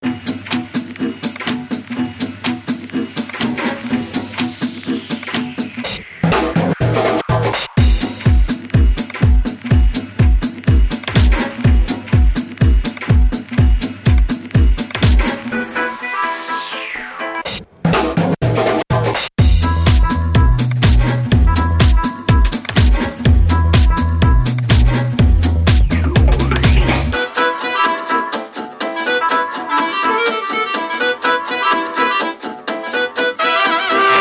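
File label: Yawning Strings Short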